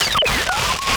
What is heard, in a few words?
Glitches; Antidote Advent